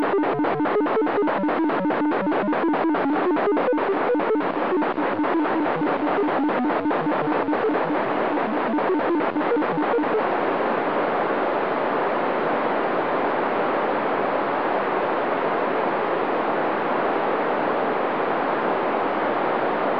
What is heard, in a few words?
222 mhz digital audio recording